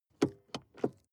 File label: sports car int handbrake release long; verkehr-transport